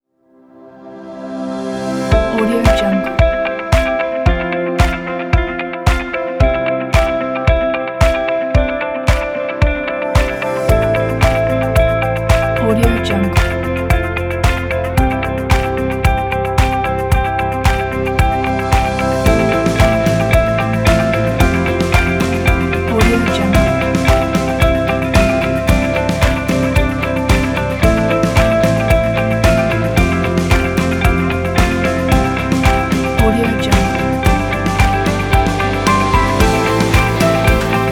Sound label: corporate motivational pack